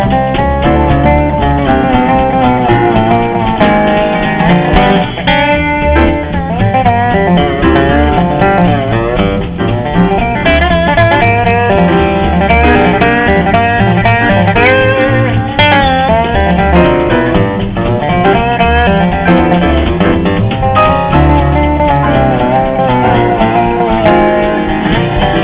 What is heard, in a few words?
Blues